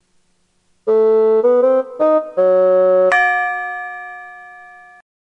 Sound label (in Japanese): quiz hazure